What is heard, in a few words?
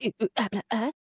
Man Dance Happy; Zomboid; Lifestyle